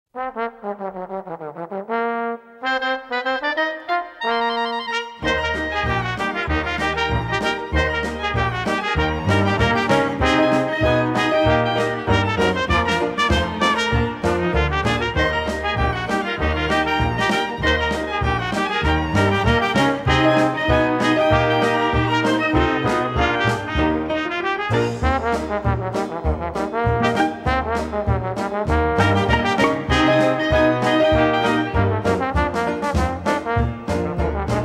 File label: Pine Apple Rag, Ragtime Orchestra